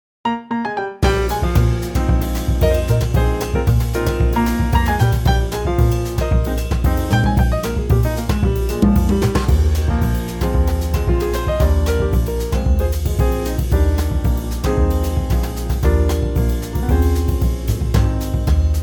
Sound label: latin Eb